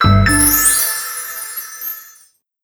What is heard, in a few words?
Success (Medium); Skylanders; Spyro's Adventure